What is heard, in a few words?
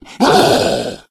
hulk pain; Left 4 Dead; Source Engine; tank